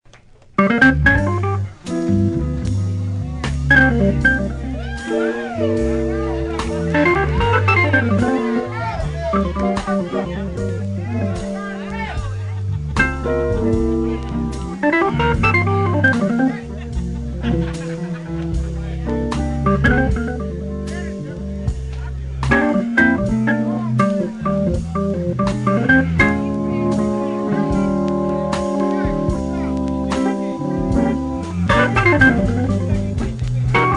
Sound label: Basique, orgues Hammond